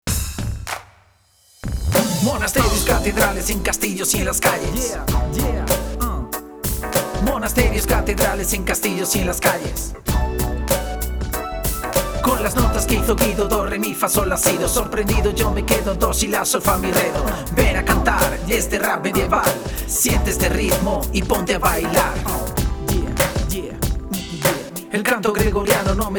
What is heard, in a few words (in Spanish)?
Rap medieval